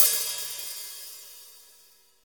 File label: perfect pedal hi hat single hit c sharp key, Royality free hi-hat sample tuned to the C#